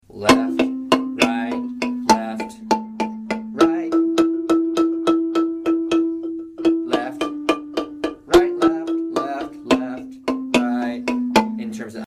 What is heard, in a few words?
t1 sticking, marimba; chikende